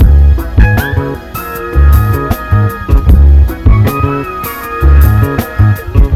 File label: satin lady loop